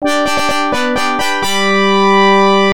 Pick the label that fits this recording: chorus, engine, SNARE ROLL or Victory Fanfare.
Victory Fanfare